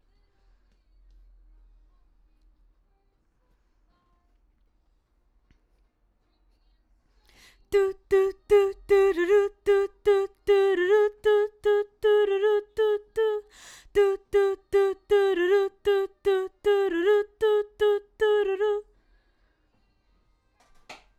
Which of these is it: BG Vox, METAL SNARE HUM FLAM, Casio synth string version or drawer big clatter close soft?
BG Vox